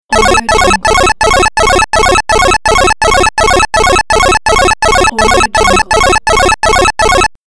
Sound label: scoring points retro tally